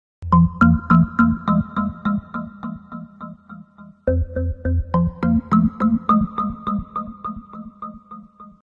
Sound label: confusion sound